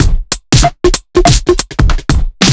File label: Trip Swing Mix, 95bpm; off the grid